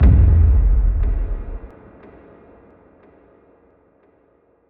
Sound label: Impact; impact samples